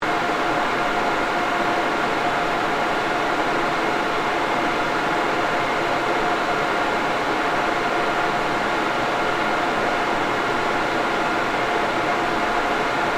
Server Room, Ambience, Computers, Loop; Room Tone Sound Effects; BlastWave

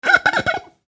Finger Squeak, Finger Squeak On Ceramic Plate; Wet Finger Squeak On Ceramic Plate , Quick And Jerky; Squeaks; Objects

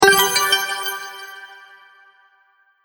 Sparkle Collect Win, video game sound effects

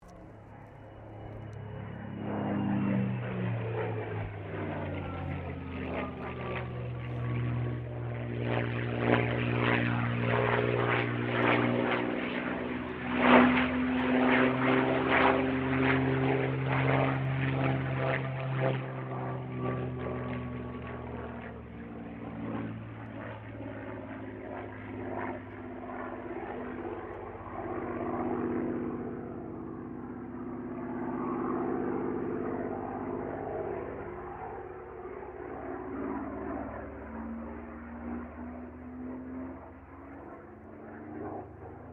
AIRCRAFT SUPERMARINE SPITFIRE MK-XVI PASS

